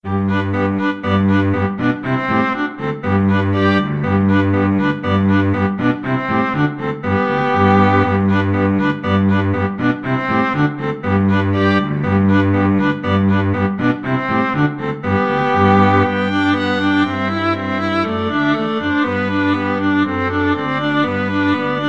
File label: ecossaise, string trio